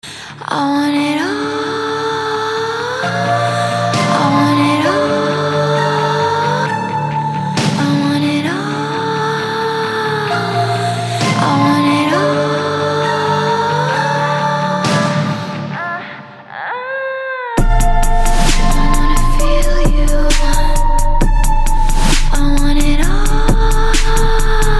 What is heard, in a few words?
low bass